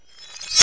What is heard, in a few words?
GLASS